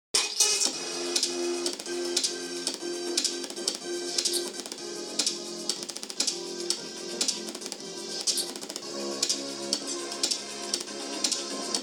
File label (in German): Beamer Ton; Blitzwolf BW-VP10